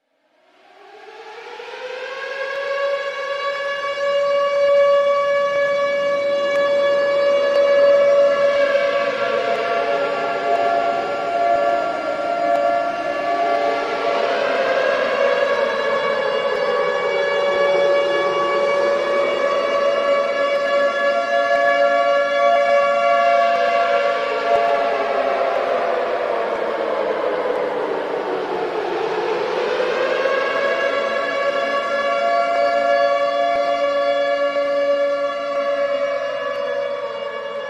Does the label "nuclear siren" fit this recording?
Yes